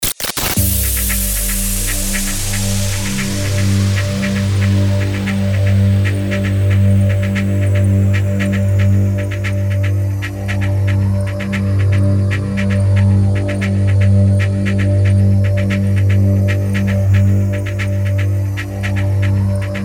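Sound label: DRONE 115BPM G minor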